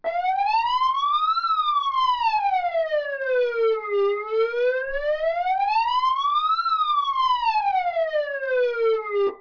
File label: siren